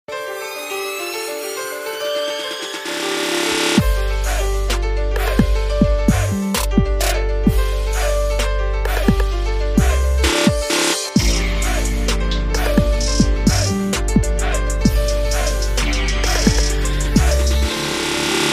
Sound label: trap remix ringtone